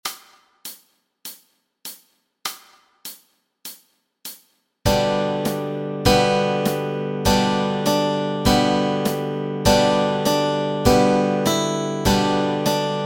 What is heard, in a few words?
Rain Rain Go Away melody and chords